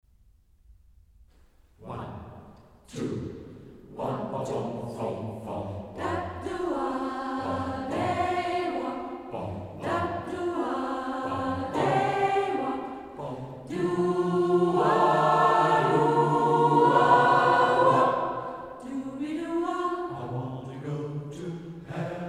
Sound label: Tutti